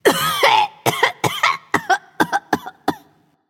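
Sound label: clear throat, female; smite; voicepack; hera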